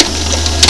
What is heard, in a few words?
VCR; Glider PRO